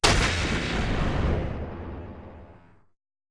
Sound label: output sound